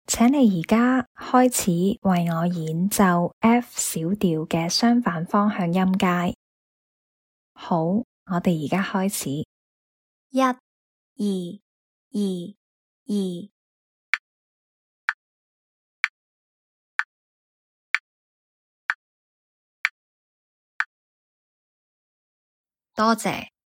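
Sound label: F minor contrary motion scale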